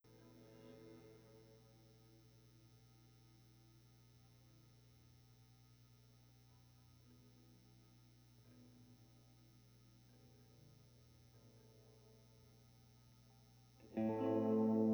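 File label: SM57 mono Guitar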